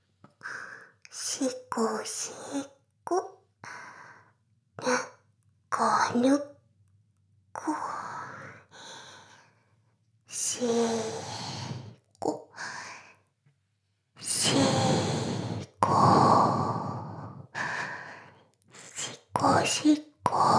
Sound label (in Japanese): しこしこ; 全妹計画２; 【メスガキ妹】わからせてくれるのよねぇ～?おにぃ～・ちゃん♪【全妹計画<オール・シスターズ> 2妹め】